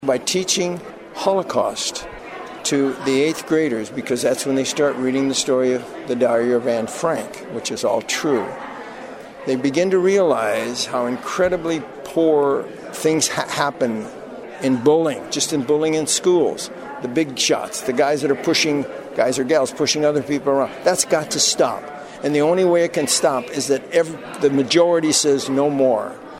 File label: violin